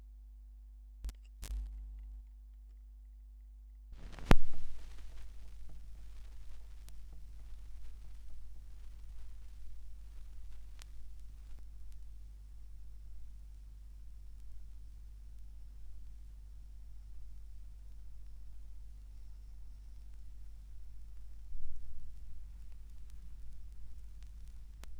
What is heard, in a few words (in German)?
Schallplattengeraeusche